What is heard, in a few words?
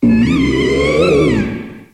Cry; Pokemon Essentials; Pokemon Iberia V1.03